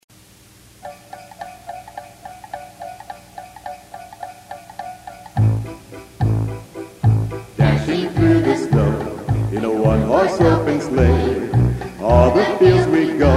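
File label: Jingle Bells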